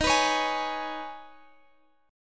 Eb 7th Suspended 4th Sharp 5th Eb, Guitar Standard; strummed; chord